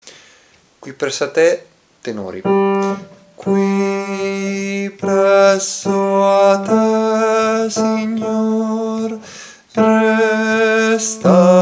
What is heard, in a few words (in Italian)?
tenori; Qui presso a te